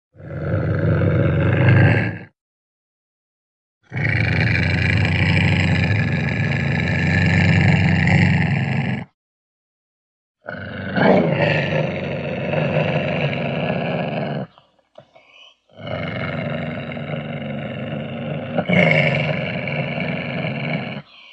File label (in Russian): ocelot, zvuk ocelota